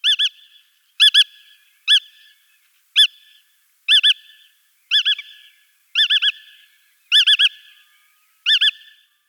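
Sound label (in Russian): malyy veretennik golos, bar-tailed godwit voice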